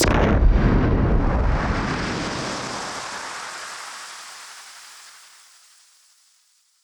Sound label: Noise Bomb Harmon; musicradar; bomb factory